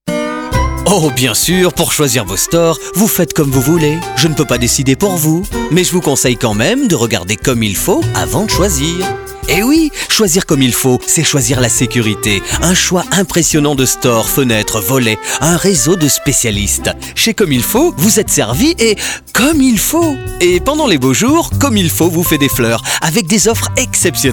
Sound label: Chalumeau